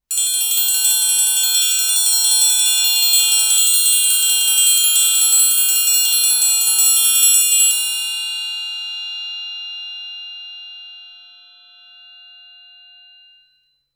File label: 8triangle roll ff stereo, Triangles, Hand Percussion